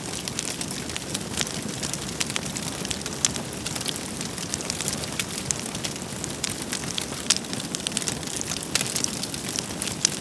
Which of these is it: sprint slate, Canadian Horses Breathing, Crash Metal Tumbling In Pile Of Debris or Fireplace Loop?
Fireplace Loop